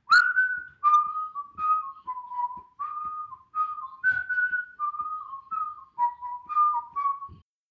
bass whistle input only

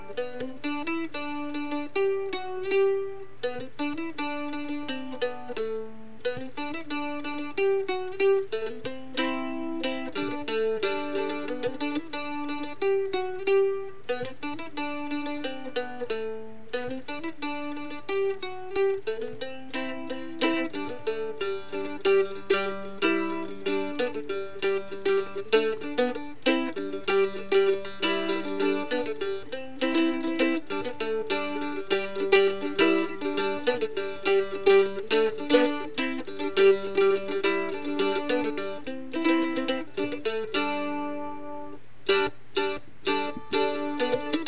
strumstick; Turkey Strumstick

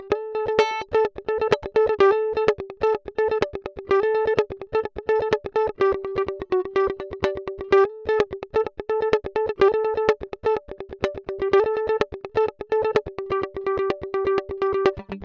mandolin comparision pickup high riff

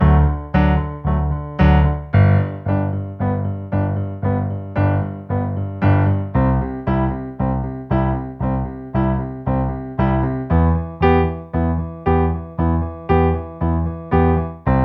chords

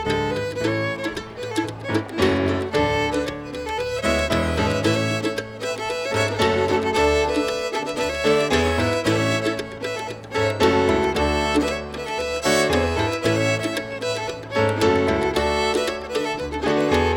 no trombone

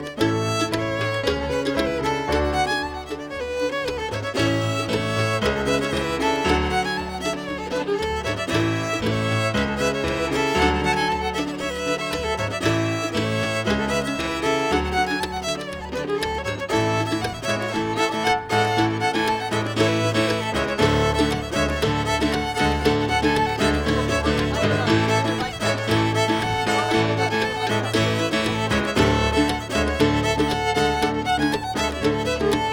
speed plow no trombone

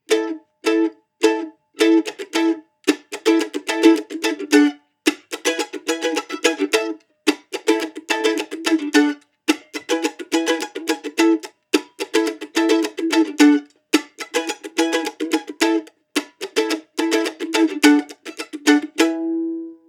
half time four one, mandochords

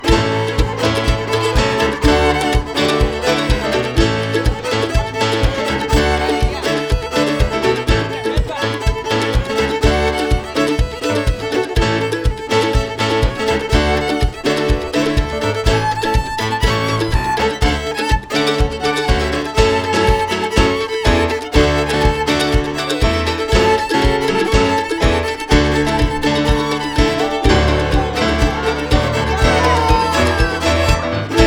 no trombone

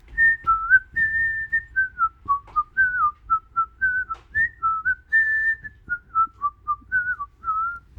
bass whistle initial

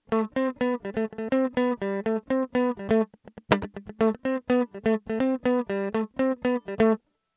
mandolin comparison electric noodles

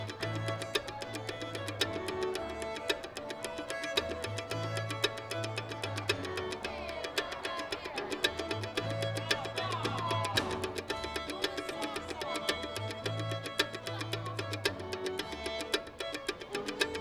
mando, mando chops

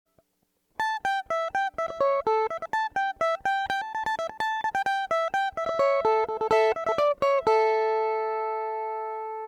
mandolin electric

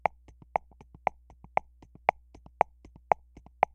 footboard comparison mxr piezo

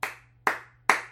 three high claps

